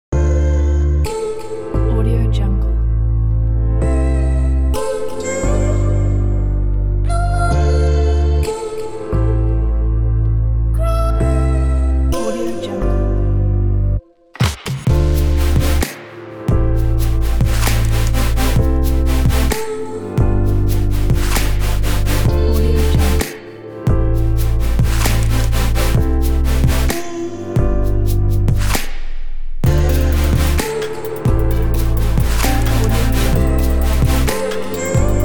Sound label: soft beat; blackcrocodile